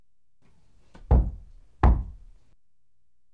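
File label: door knock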